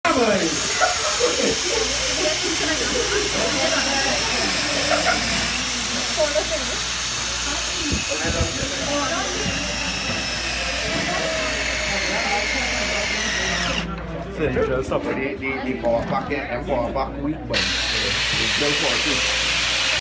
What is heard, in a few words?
Drilling Sole Ulcer